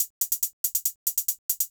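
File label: HIHAT ELECTRO 140, Techno Drum; Techno2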